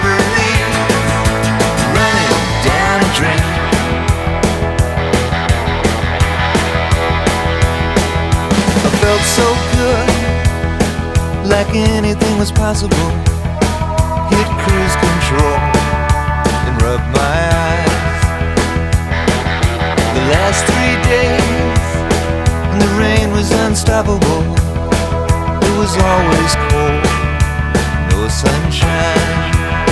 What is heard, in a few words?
Workout playlist for running 170 BPM